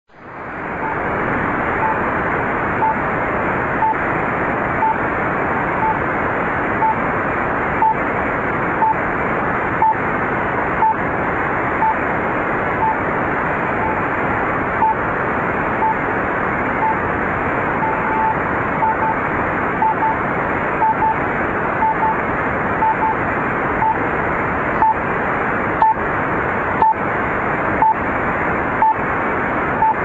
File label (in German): zeitzeichen, funk